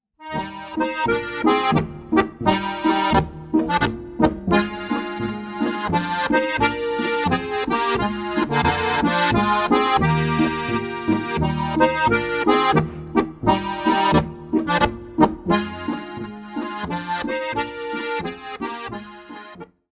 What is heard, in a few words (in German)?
Lederer Boarischer, Harmonika; Noten